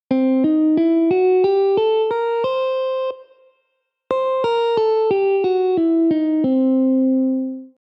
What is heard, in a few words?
Hungarian Major; scales